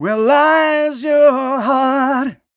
m 127 mel a, Vocals

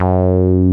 wow bass; Masterbits; Dance Trax

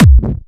Clubby Kicks, Bassdrums

